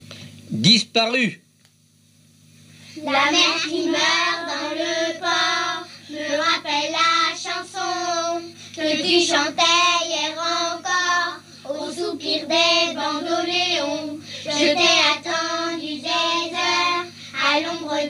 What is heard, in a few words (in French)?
Chorale Disparue; Chorale Morieux et Créteil